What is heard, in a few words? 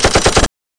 smg fire burst; smg1; weapons